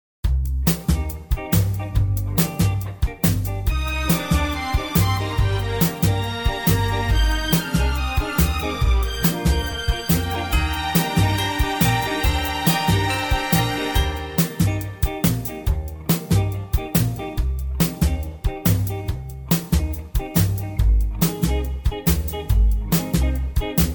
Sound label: (Eb) No Backing Vocals